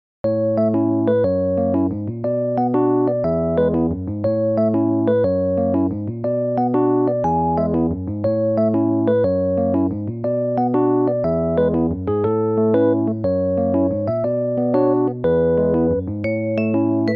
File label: Newage; KIDS 귀여운